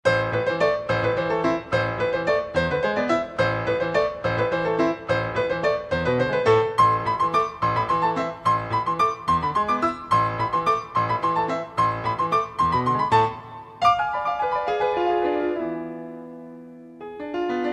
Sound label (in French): piano seul